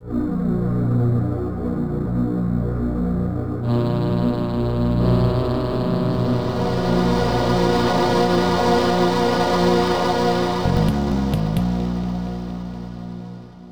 Logo (SNES Version); Delphine Software

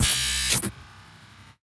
Sci-Fi Mechanism; Sci-Fi Weapons; Source Sounds

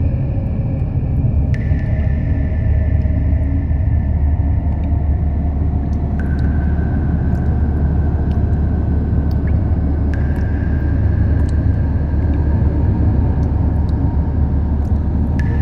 cave ambience; Deadly Edge

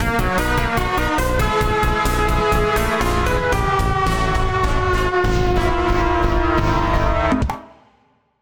Jingle- Game Over!; Dueling Ages; The Sonic Time Twisted Original Soundtrack

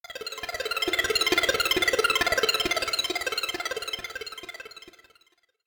Monster Mysterious

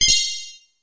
crane fix

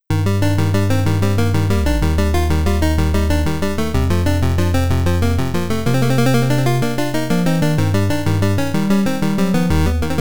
Chiptune; Heroes

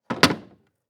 cabinet door close slam

